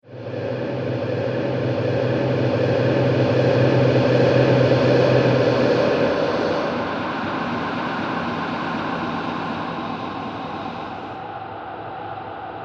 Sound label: Consumed By Ghosts, ghost sound effects; horror